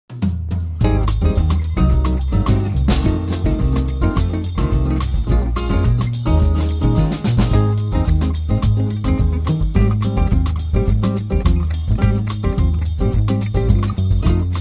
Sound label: Latin Lounger, Underscore